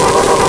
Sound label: All Damage